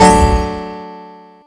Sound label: Guitar Chord; gamerip; cat quest ii